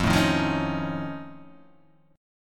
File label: F Augmented 7th F, chord